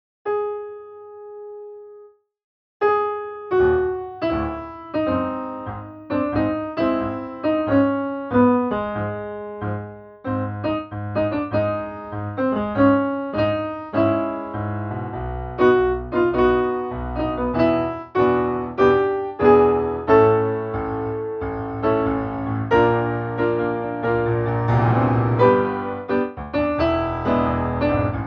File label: Piano